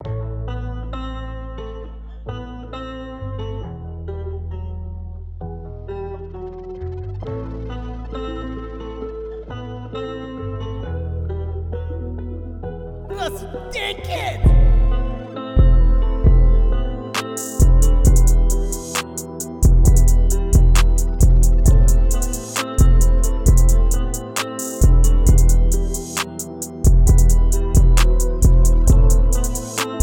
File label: 133 BPM Key E Minor; BrasiBeats